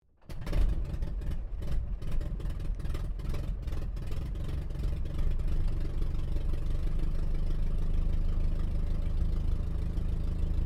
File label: AIRCRAFT DH82A DEHAVILAND TIGERMOTH STARTUP IDLE INTERIOR; RAAF Tigermoth; Airplanes (Sound Effects:Vehicles)